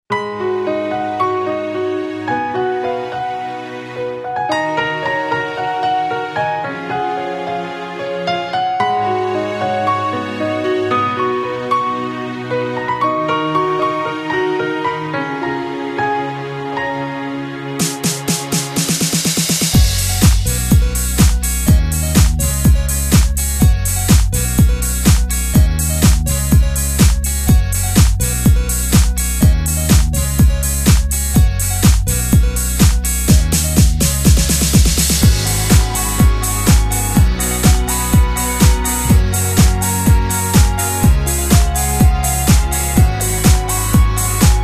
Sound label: Instrumental